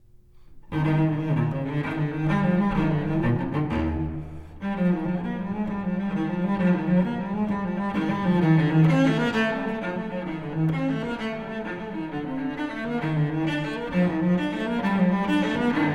Suite No. 4 in E flat Major Gigue; BWV1010

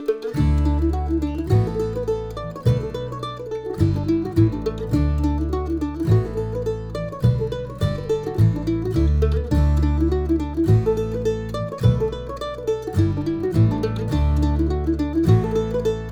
Medium sized group; Old Grimes

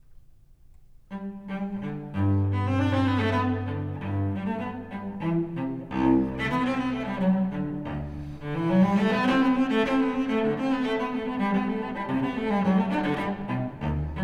Suite No. 1 in G Major Courante; BWV1007

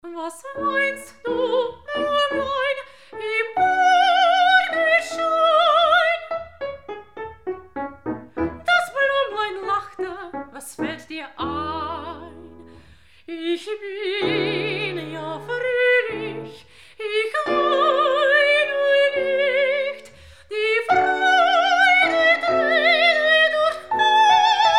piano, voice; Sechs Lieder Op. 23 no. 1 Was weinst du

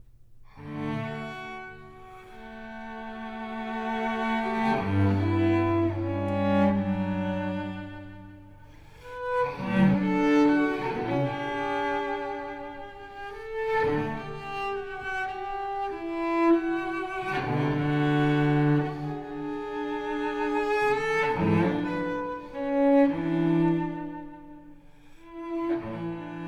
Sarabande; BWV1012 Suite No.6 in D Major

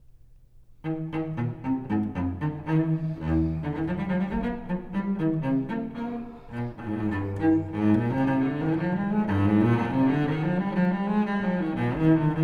Suite No. 4 in E flat Major Courante; BWV1010

